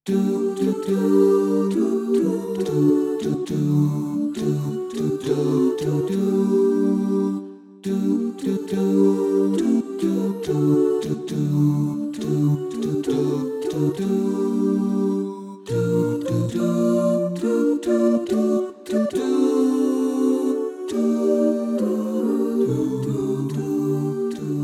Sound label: Bas; Gladjens blomster